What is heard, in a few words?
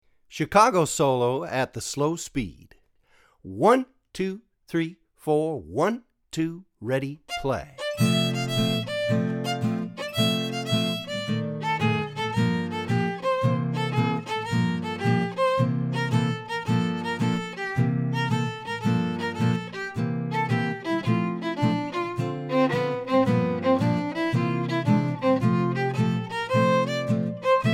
Solo Slow, swing jazz; Swing Jazz Violin with Hot-Club Rhythm